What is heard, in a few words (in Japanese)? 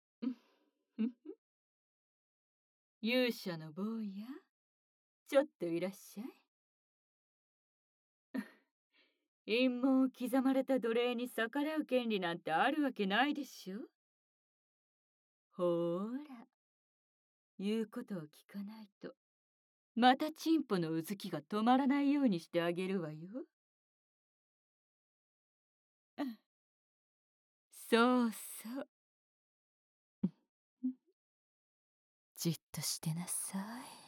耳舐めボイス; 魔王アルザ